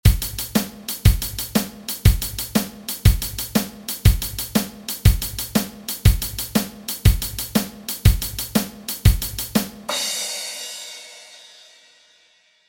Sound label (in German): Drum Beat ternär 120 BPM